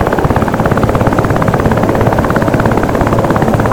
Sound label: rotor SH3, aircraft engines; DCS